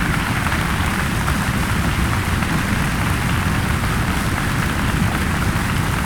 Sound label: applause and stomping; Golden Crayon Sounds